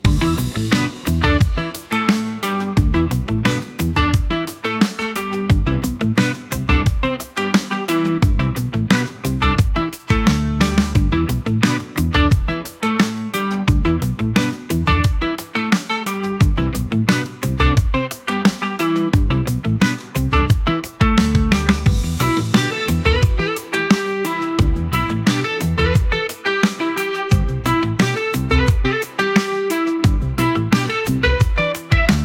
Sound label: Sunny Beach Dub Vibes; StockTune